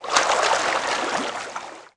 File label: Swim; Player; Footsteps